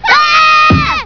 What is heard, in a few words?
aaahhhh; simpsonovi